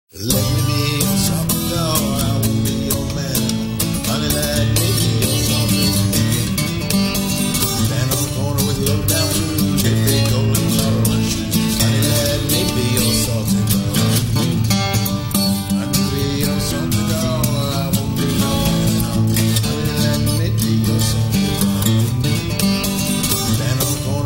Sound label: Blues